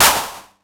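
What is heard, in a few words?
909 clap; PERC TR; Wizoo - Platinum 24 Electronic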